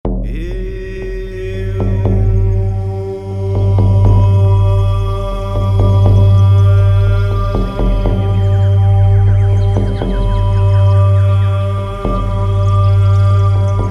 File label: Drums, Gongs and Rituals; Healing Sounds